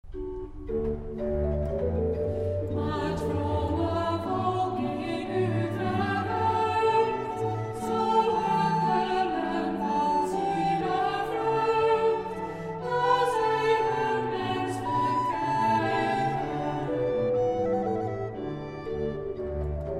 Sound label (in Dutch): Psalm vocaal